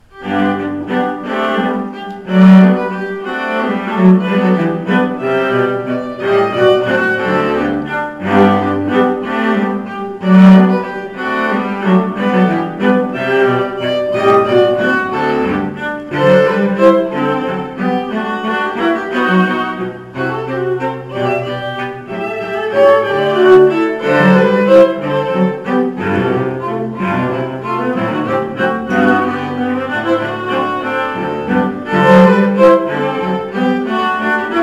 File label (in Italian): Trio per Archi, Minuetto e Trio; GB Marzuttini